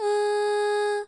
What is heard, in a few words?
Choir; osu